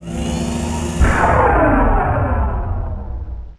Flash Bomb; Net Power 2000 #2

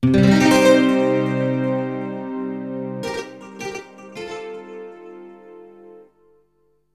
ELECGUIT, AMBNT, 160, C; eJay; Ambient; Guitar

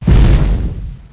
walk; SSTEEL

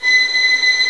Violin Ens "harmonics"; Indiana Jones Demo; iMuse

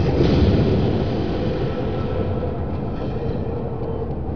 mine wrk; machines

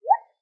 natura msg sent; Spik

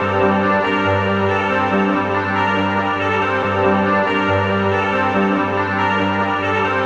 Guit pad; Techno; Melody; TechnoMusicMaker

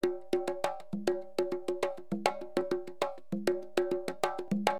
CONGA, Perc; LiveAct Pool; AcidJazz Funk

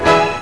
pig; My Animated Word Book; farm

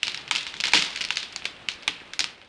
creak, plnt rustle; impact; sindemo2

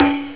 cowbell, drums; OctaMED SoundStudio V1

